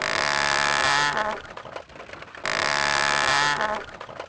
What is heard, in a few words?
windmill; Pirates Of The Caribbean